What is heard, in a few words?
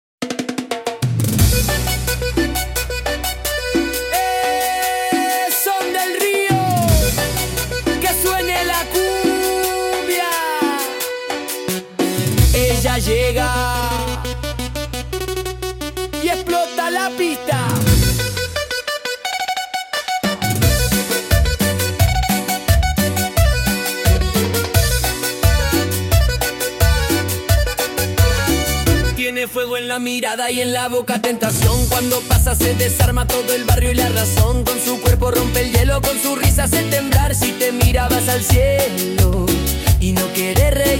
bombon latino